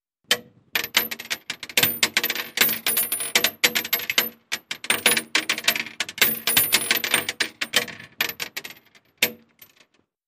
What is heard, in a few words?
Shell Case Mult Steel, shell casing movement